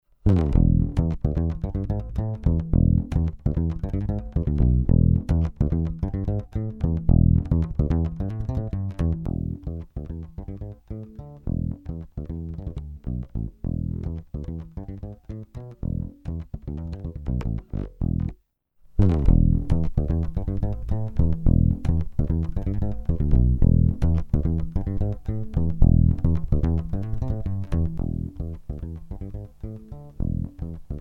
Surfcaster Passiv dann Aktiv DI Preamp; Charvel; Avalon via Tuner Out

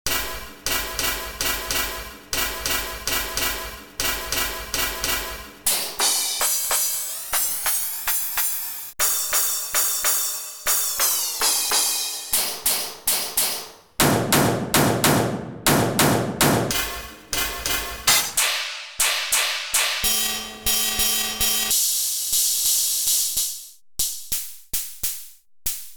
CRASH LFO

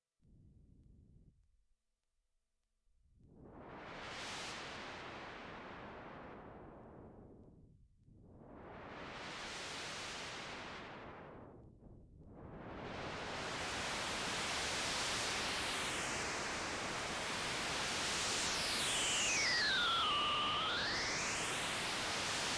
wind; parva

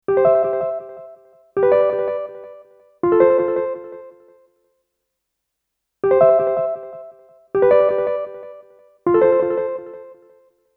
PP Delay FX; Zoom L12

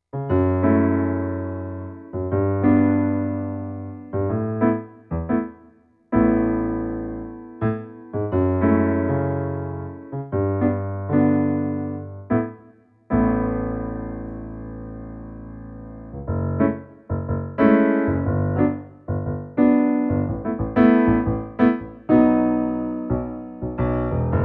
Casio PX-S3000 Stage Piano

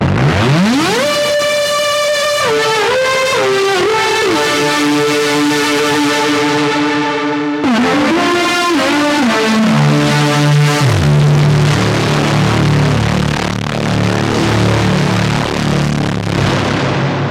Analog Synth Fulltone GT500

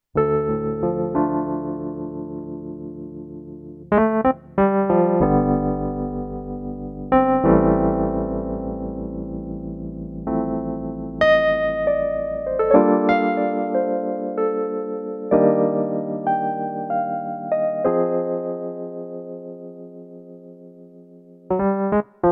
E Piano; Korg B2

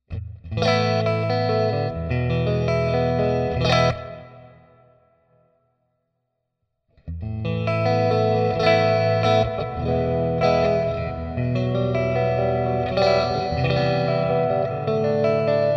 Hall Reverb

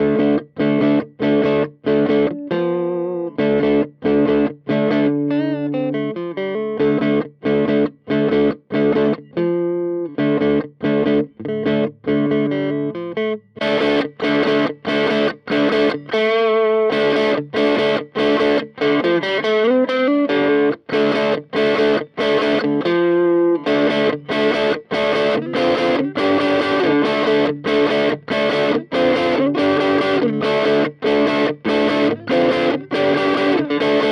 Fender treble boost; Compadre

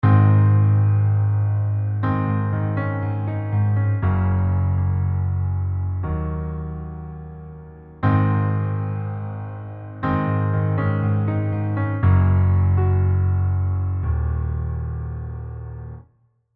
EZkeys 2 Session Grand Ballad

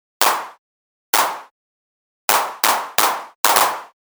Clap

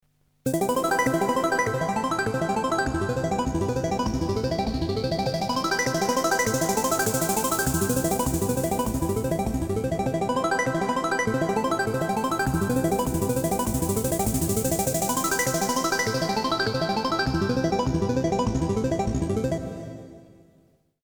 medusa ocean hall

